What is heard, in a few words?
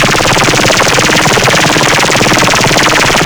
Vay Hek Drone Zap Attack Loop; Warframe